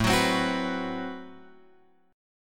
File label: A Minor 6th Add 9th A, Chord; Am6add9 Chord {5 X 4 5 7 7} strummed